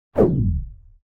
Whoosh Short And Deep Sci-Fi; Hollywood Edge; sound designer's toolkit v2

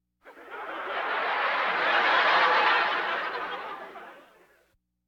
Laugh tracks

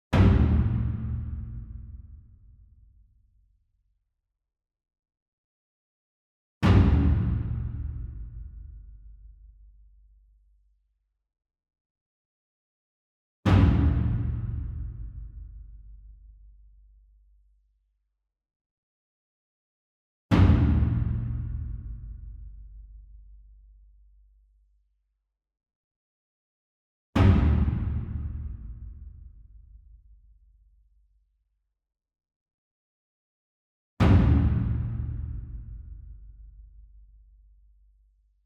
ENSEMBLE DRUM Taiko Hit Far; boom library; Cinematic Strikes Construction Kit

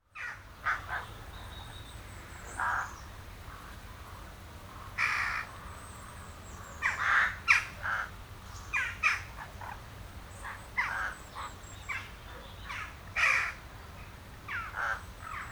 Birds Crown & Jackdaws; Birds, Insects & Animals

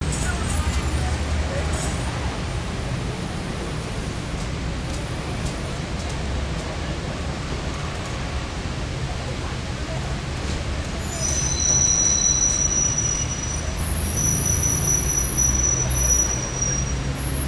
city london traffic bells, street traffic; best service Blue Box

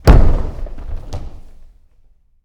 Boxing Ring Bodyfall

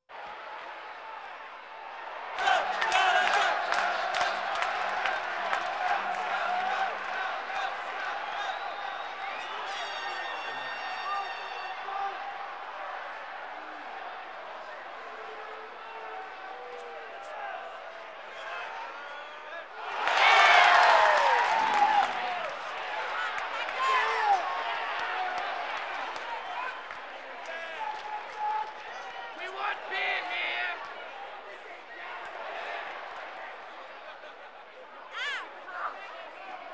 Sports crowds

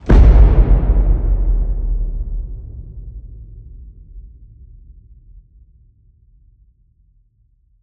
HIT Low, Cinematic Hits Designed

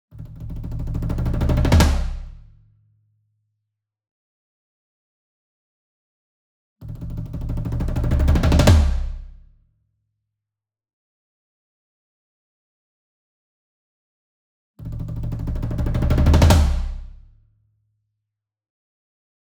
SINGLE DRUM Tom Concert Roll Hard Beater Near; boom library; Cinematic Strikes Construction Kit

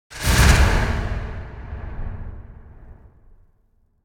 Impact Whoosh Airy Medium Vibrating Panning; Hollywood Edge; sound designer's toolkit v2

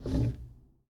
Foosball Blocker Rotate

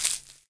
step leaf; DeadFright

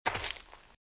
Footsteps - Leaves - Walk - Left; RadwinIsland

